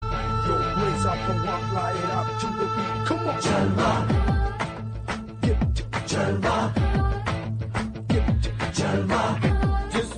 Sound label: srilankan ringtone